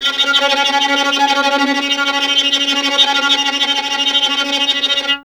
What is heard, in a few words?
STR VLN, Vln Trem; Solo Instruments; Miroslav Vitous